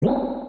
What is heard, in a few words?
flop shake sound; Arc of Templar